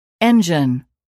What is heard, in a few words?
engine